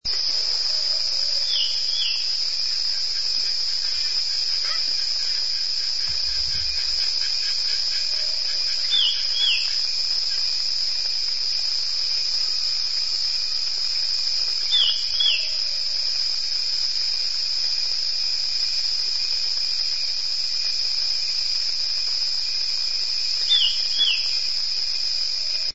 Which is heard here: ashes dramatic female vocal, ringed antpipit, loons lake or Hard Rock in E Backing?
ringed antpipit